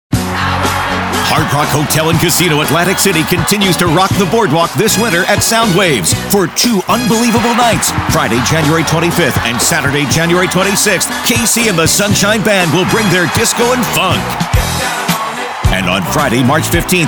Disco And Funk; HardRockHotel

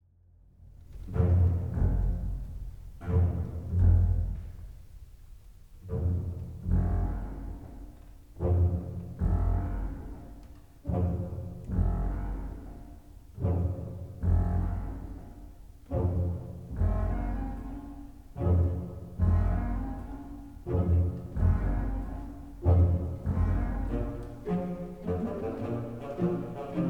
Orchestre; Dukas 2; Ansermet

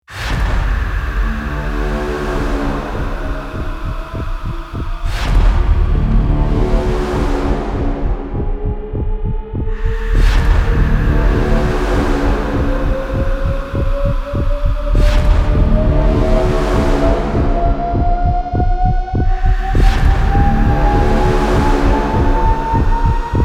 Crash Bang

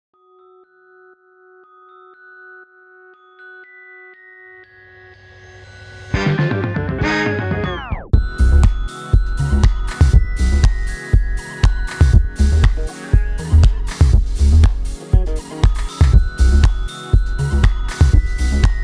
Disco Lullaby R&B groover

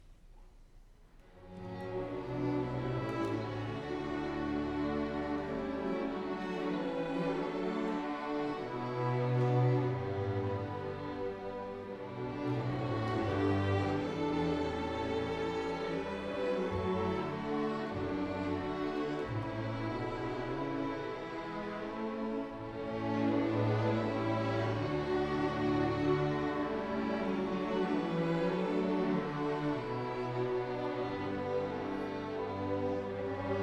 Celtic Celebration Bridge The Londonderry Air

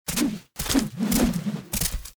Evade Forward; Lust Princess

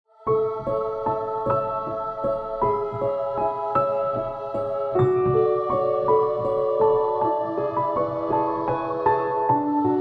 Lullaby

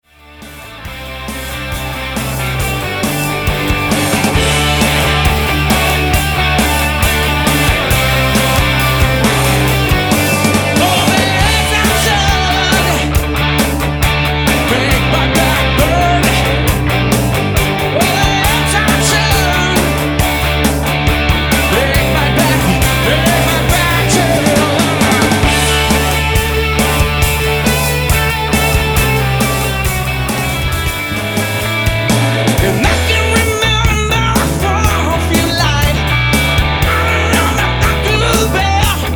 Live Mix

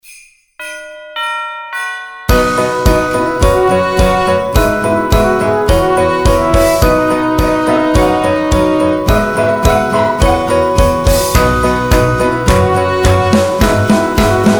Yuletide Sleigh Ride; Holiday Music

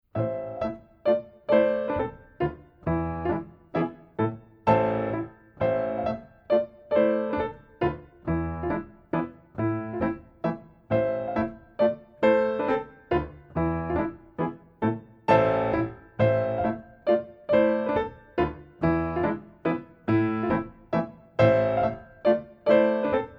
Mazurka 3/4 4X8

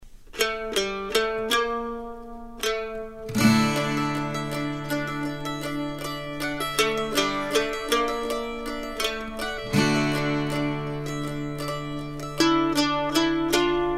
North African

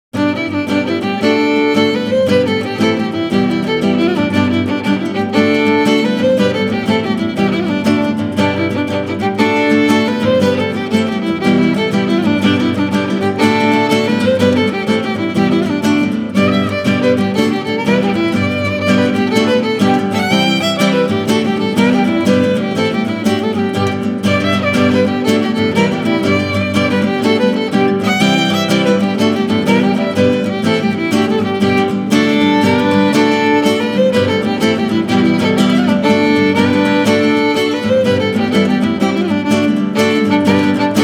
Blarney Pilgrim, Celtic

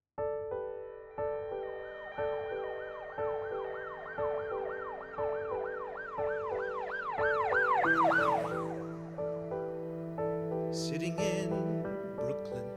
Ballad. Orchestral.; Connections Musical

